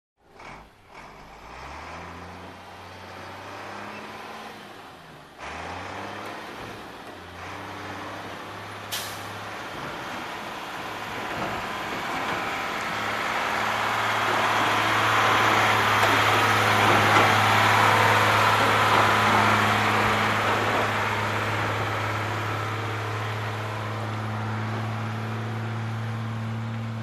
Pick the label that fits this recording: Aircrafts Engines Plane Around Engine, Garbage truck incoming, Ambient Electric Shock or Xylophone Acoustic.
Garbage truck incoming